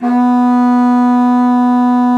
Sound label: BASSOON B3; Xsample VOL-6; C BASSOON